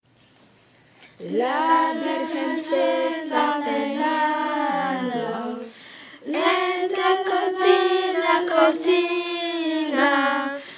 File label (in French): chant noel en espagnol eleves; ulis